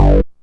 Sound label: Synth Hit G; Trance; Synth Hits; Zero-G Trance Inducer; 90 s Sample CDs